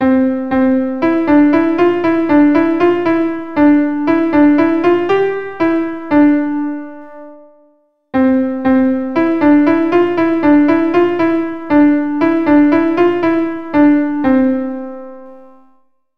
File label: 5 note Comp, keyboard